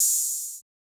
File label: OpenHat